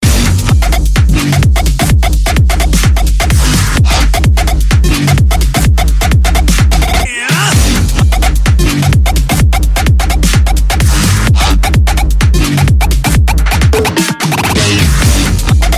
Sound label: edm x iphone ringtone